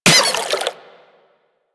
Water Sprayer Beam Impact Flesh; Warframe

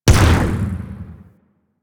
Swarm Missile Explosion

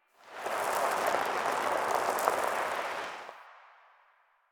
Tire On Cement One Off; Warframe; Ambience; Nineteen Ninety Nine

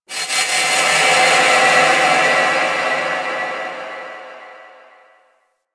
Moon Texture Small, Ambience; Warframe; Orokin

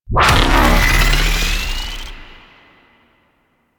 Blast Ward Cast; DE; Warframe; Weapons; Archwing; Powers; Archwing Healer

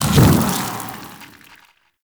Scorch Mark Dissolve; Warframe; Sacrifice; Courtyard

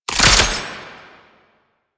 Belt Fed Rifle Reload; Weapons; Warframe; Tenno; Frumentarius

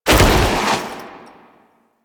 Foley Roll; Warframe; Kahl

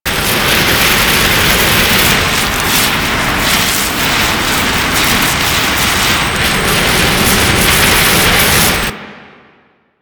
Jet Pack Loop; Jet Pack Marine; Warframe; Grineer

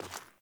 Sandals Walk Rock, Footsteps; Characters; Player

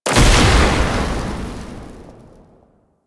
Alchemy Grenade Explosion Fire; Warframe; Entrati

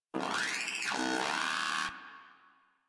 Moa Aggressive Vocal Stomp; Warframe

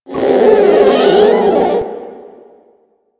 Strike Scream; Warframe; Proto Infested Ancient; Abilities

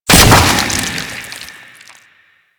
Proc Toxin; Mods

